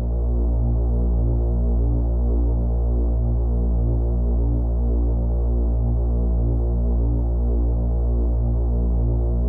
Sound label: recharge battery, vehicle; CosmicRage